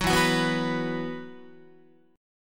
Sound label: E Suspended 2nd, Guitar; Esus2 strummed; 9,12,9,9,10,10